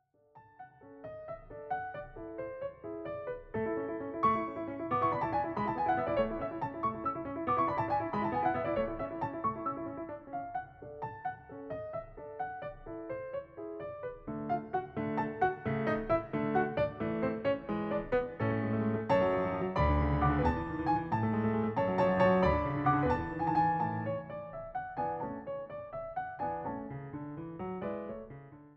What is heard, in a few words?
Piano Sonata in D Major, Rondo- Allegro; Piano Sonata No. 9; K. 311; mozart